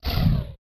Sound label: Machine Charge Down; SoundsCrate